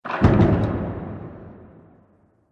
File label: Haunted House Door Closed; Creepy Door Sound Effects